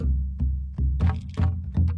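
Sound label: Calm Far, music; From Dust; PS3; Xbox 360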